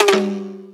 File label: No Answer, notification